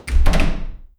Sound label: wooden apartment building, indoors